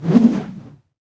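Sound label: Goblin King Idle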